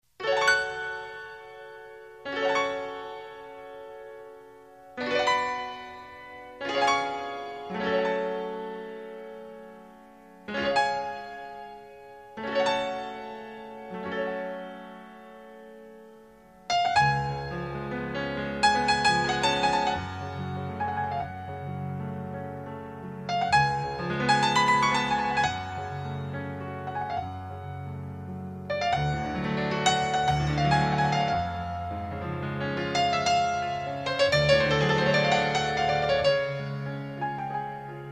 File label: piano